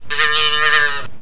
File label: cow toy